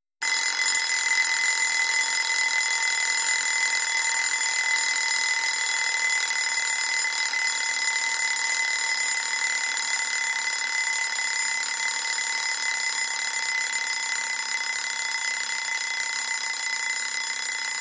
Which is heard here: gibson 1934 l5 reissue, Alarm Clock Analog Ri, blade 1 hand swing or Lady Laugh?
Alarm Clock Analog Ri